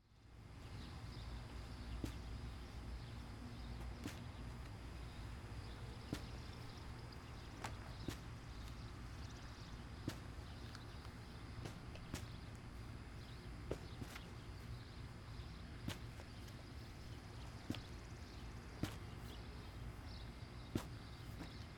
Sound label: Industry Ambience Home Construction Backyard Excavation With Wheelbarrow